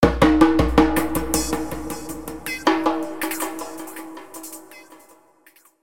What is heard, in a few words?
Cool Drums